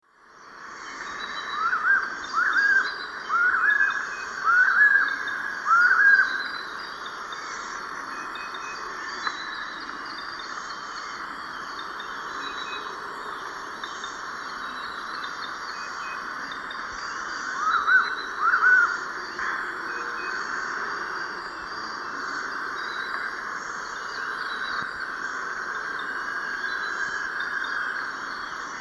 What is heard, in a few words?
Bucco capensis, song; S. America; Eastern Lowlands